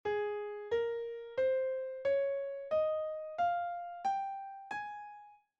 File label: Ab major scale